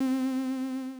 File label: synth